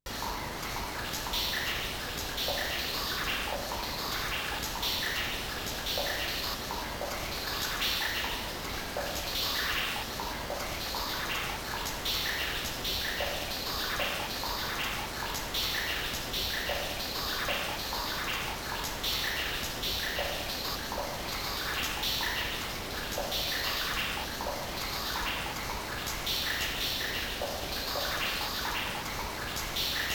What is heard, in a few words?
Stage Ambient Music; snk arcade sound digital collection vol. 18